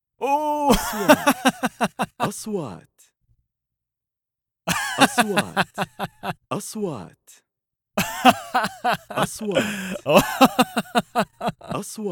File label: MAN LOUD LAUGH